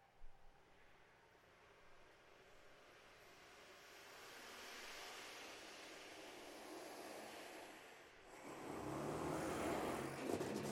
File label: for 12 saxophones and electroacoustics